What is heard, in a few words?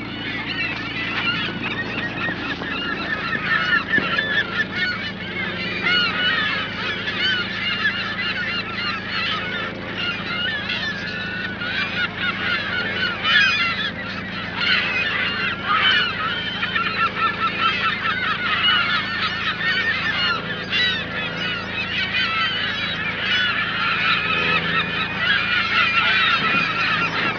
seagulls